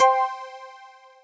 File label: Correct